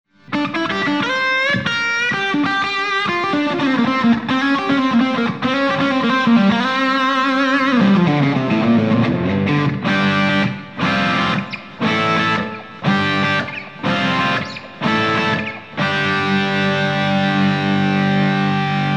SD1 Full Gain

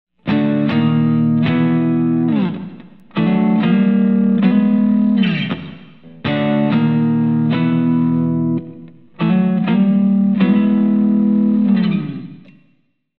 BD2 Clean

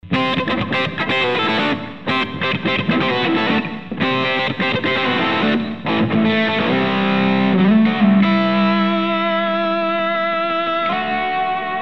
BD2 Full Gain

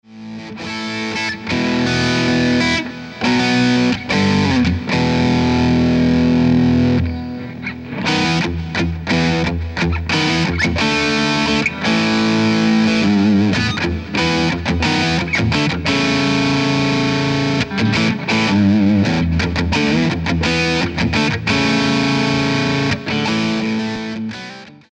Metal Dirt, Cyber Deluxe

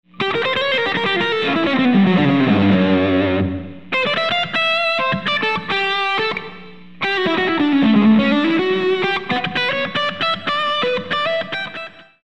BD2 Full Gain

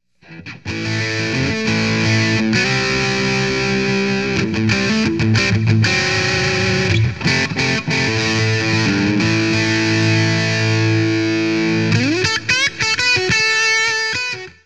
Bridge Dist

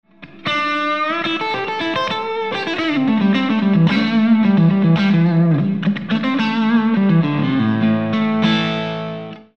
SD1 Half gain

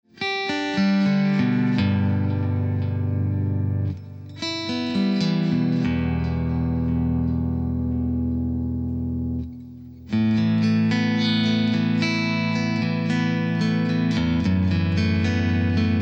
Metal Clean, Cyber Deluxe

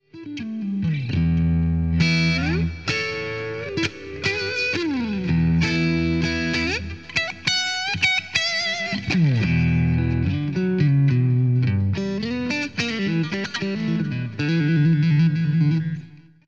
Leed Crunch

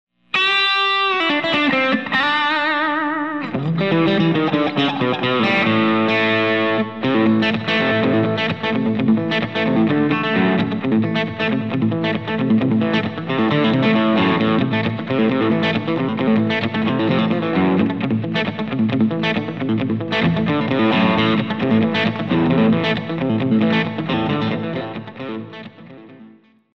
BD2 Half Gain

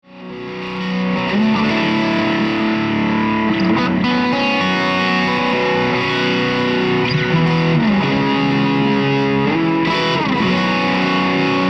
Opto Line6 ODR1

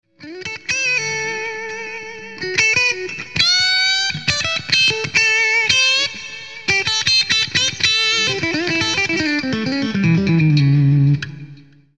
Both Pups

